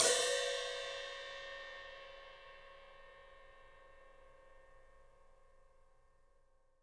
ZILDJIAN HT; 3D Sound; 3D Drums

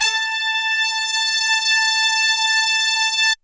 HORNS A4 L

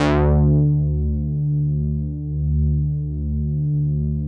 OBERHEIMS

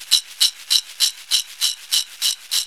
BASKET, MISC SHAKERS; Zero-G Ambient 1

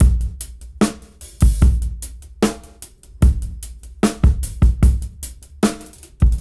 Ballad 74 BPM; AMG - Keith LeBlanc Phat Butt Jams

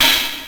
HAT; PlatinumPhattCD1; E-MU Producer Series Vol. 8 – Platinum Phatt

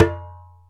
Ashiko Gun; Planet Earth; Digital Sound Factory Planet Earth

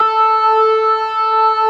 ORGAN, NO PERC; C3; Keith Emerson Signature Series

